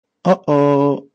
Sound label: Uh Oh